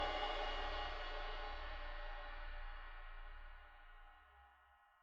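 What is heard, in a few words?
suspended cymbal roll f release; Idiophones; Struck Idiophones; Suspended Cymbal 2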